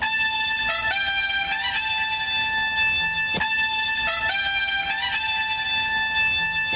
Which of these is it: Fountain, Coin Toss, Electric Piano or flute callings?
flute callings